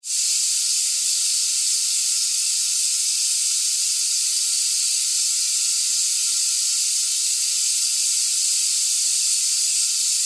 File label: Gas Leak Hose Sci Fi; 5.1; Sound Effects:Science Fiction; BlastWave